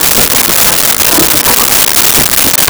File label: Tune AM Radio; Communications